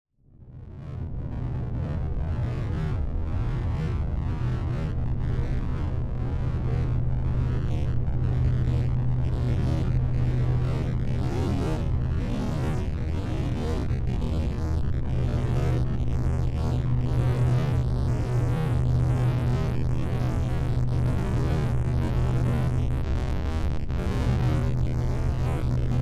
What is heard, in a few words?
Drone Digital Feedback Phaser; Tech; Blastwave